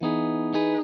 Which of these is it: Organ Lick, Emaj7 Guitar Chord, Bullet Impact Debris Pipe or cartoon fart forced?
Emaj7 Guitar Chord